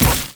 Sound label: infected cleaver; mundo